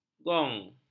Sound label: gong